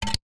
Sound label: household cd disc set down hard surface